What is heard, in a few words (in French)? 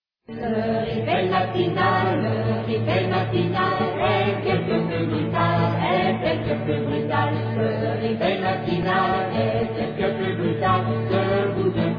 reveil matinal